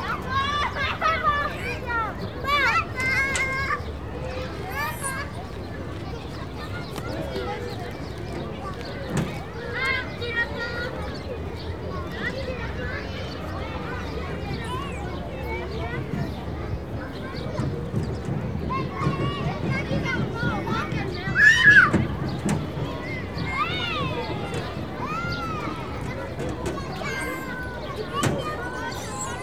playground

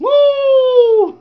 "woo", male

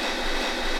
static

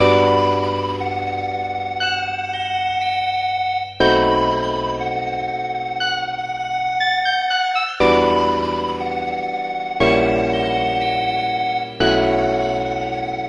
shivery funk, Electronic Loops

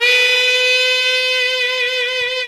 saxxx, stabs

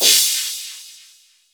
Cymbals, Electro

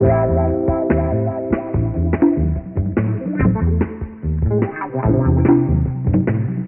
guitar, Loops, Phonky

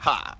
ha, ooh ahh

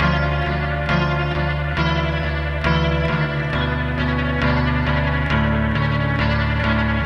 guitar, Sad

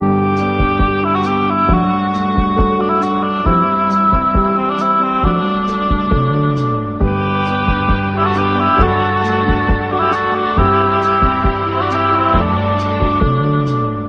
sax; Phonky

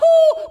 woo

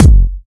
Kicks Electro

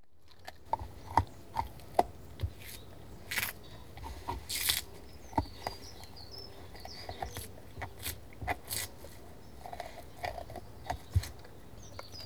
eating

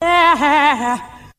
"ah ha ahh", SUNG; Voice; ooh ahh

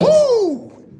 woo

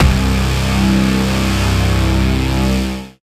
bassed, Synths; Notes

